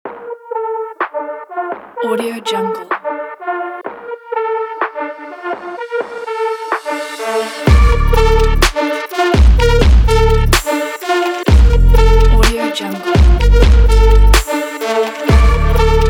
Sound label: sport trap abstract